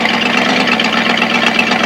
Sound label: motor